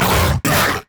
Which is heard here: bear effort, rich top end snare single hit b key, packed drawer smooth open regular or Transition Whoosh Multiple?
bear effort